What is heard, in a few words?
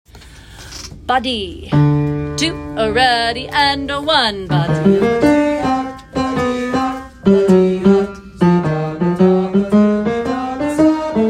Tenor Bass; Mean Girls